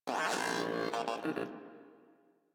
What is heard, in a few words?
Moa Aggressive Vocal Greeting; Warframe